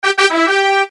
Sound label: Turn Fanfare; Stikbold! Dodgeball Adventure Deluxe